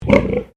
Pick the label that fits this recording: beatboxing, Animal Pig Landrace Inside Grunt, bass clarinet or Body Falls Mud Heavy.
Animal Pig Landrace Inside Grunt